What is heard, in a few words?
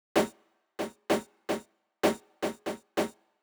drum 128 big prc